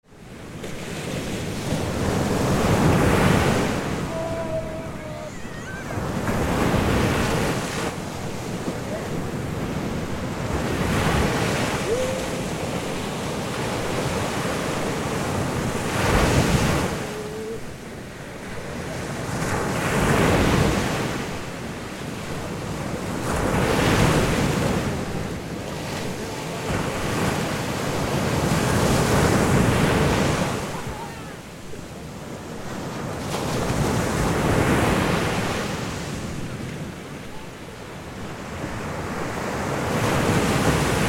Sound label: Beach ambience strong waves on a sand beach shore